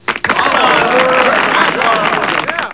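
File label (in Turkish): alkış, insan; efekt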